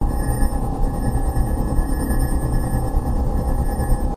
alien ship craft hover loop ambience synth electronic steady tone